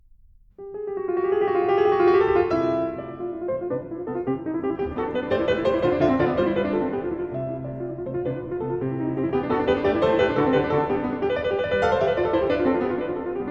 Lieder ohne Worte, op. 67 - No. 4 Presto Spinning Song, Piano